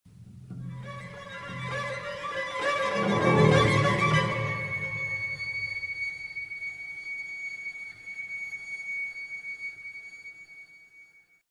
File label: So Scary Tone